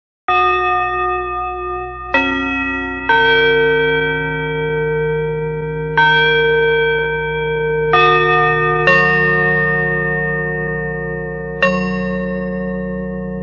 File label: chime; denon